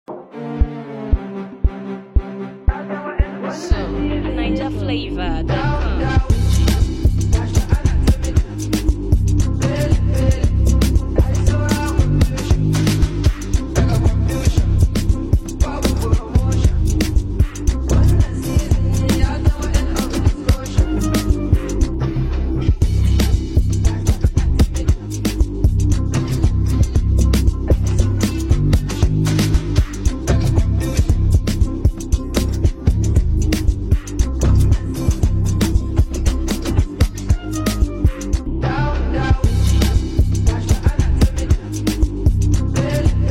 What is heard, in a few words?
Hausa piano